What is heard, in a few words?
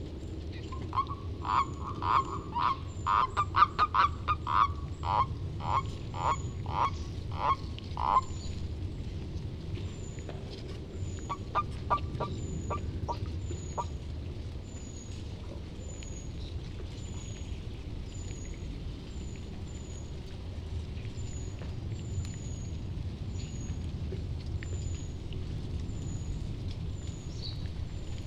common toad bufo bufo; lyagushek i zhab